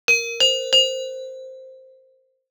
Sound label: Bells